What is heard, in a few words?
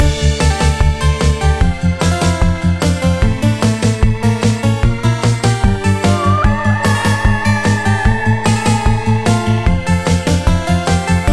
menu loop; Bunnicula - Rescuing Harold